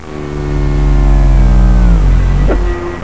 landing gear, aircraft takeoff landing

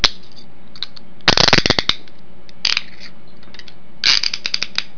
police handcuffs

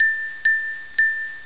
car door ding

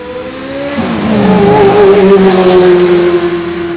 race car

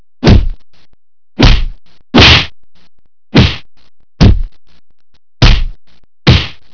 punch, fighting

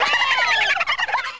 cheer car, cartoon

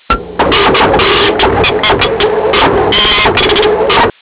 dot matrix, computers

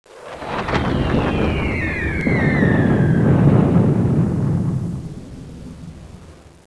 jet bomb; aircraft

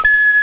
future beep; computers

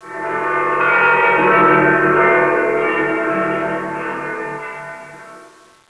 cathedral

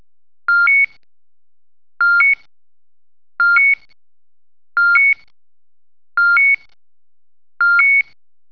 radar, aircraft cockpit

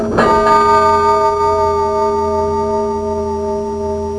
church bells

